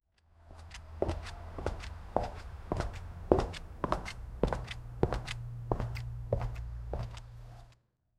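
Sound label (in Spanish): pasos acercándose, approach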